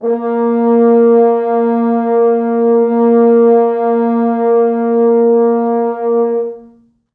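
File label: 4 French horns sustain A#2; East West; Quantum Leap Horns Sax; 4 FrHorns SUS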